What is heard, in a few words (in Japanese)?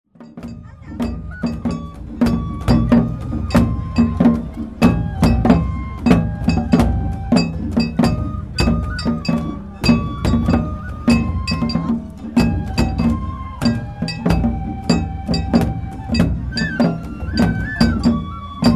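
本囃子, 弥生祭お囃子; 中鉢石町; 弥生祭は日光二荒山神社の例大祭。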